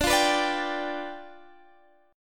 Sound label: G 7th D